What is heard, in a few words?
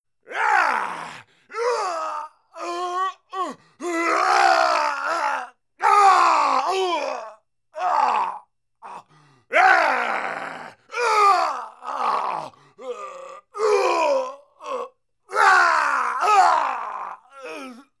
Fighting Yelling Male, Vocals & Wallas